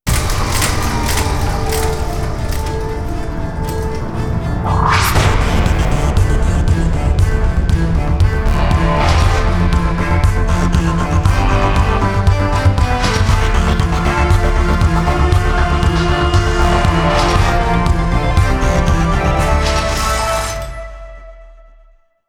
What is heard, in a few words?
Tomb Death Song Electronica; Zombie Revelations - Origins